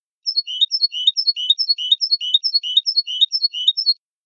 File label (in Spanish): mecida